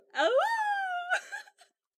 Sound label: awoo